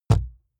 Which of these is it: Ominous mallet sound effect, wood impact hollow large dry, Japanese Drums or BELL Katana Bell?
wood impact hollow large dry